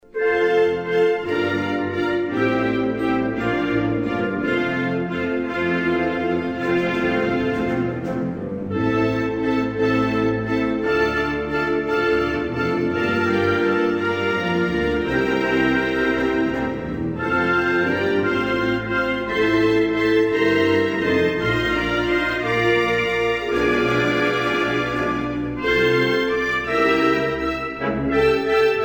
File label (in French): Yemen, Hymnes nationaux